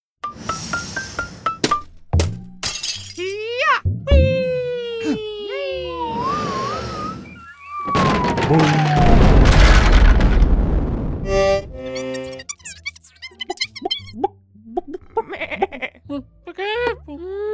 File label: Cartoon Mayhem Mini Library